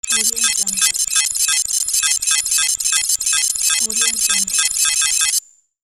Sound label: computing device